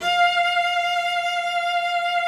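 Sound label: F5, cello vibrato; Xsample VOL-7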